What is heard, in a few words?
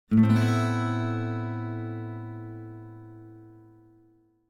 Acoustic Guitar - Minor Chord - Orotund Septa Chord (7); Hollywood Edge; sound designer's toolkit v2